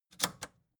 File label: record player power click on slow